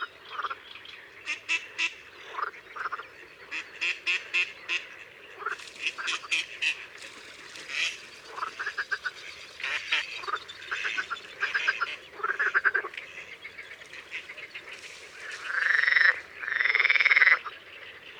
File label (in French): Canard colvert; Isere; Concerts naturels de l'Isere; Studio Les Trois Becs